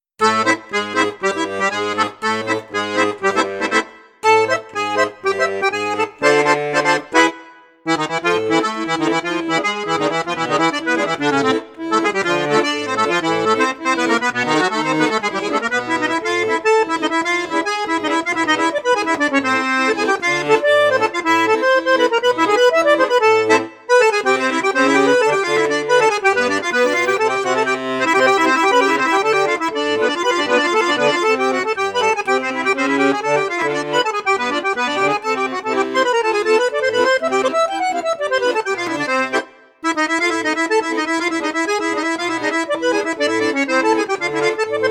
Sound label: accordeon; piermaria prodige